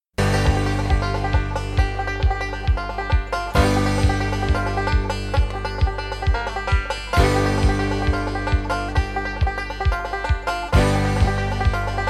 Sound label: Five String Banjo Thang